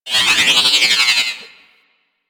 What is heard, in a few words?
Robot Metallic Textures, Ambience; Venus; Objective Rooms